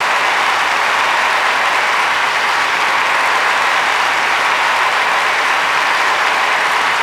Medium Audience

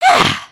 drink

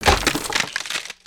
Pet Rock Dog House Smash; destructibles

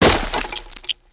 hazmat smashable, destructibles

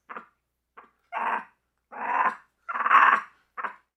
Pets Skunk Idle Bored; creatures; Lego

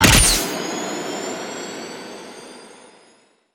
rocket build chunk

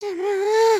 need to pee look; emotes

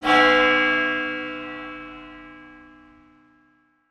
bells; destructibles

